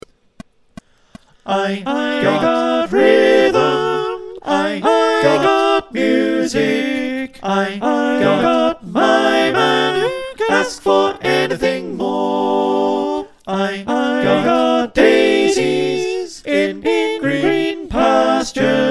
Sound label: SATB, choir